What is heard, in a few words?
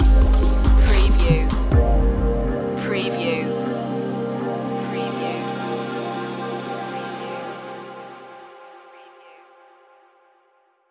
daylite stinger